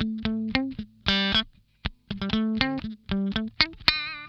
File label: DAMPED, Funk Guitar; 90 s Sample CDs; Zero G